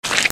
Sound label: FOOTFALL